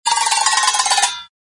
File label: tumble down